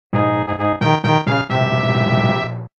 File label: super mario all stars soundtrack smb2 fanfare; Memes